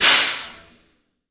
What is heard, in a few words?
Aircrafts Pneumatic Brakes Off; DCS